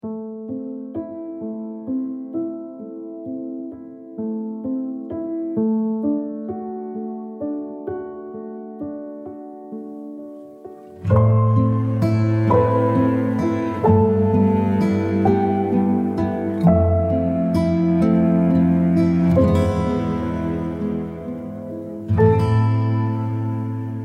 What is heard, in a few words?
tender piano folk